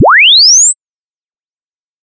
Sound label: lin sweep 2x down sinc; sample rate conversion down